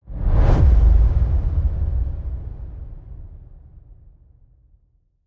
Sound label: Deep Whoosh Boom